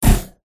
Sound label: jelly fish boss attack contact up